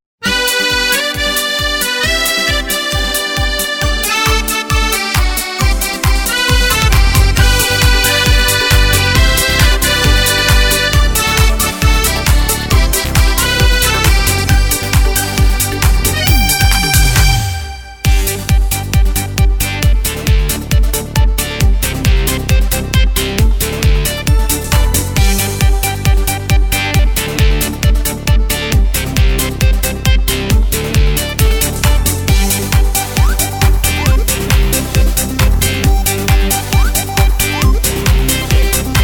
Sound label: Korg Pa3X